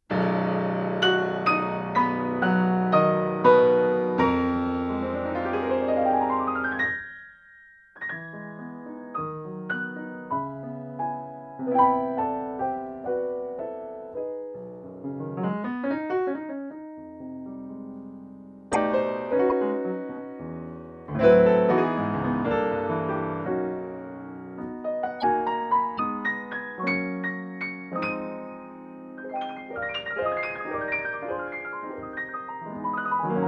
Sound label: Shure 353, piano; how to record a piano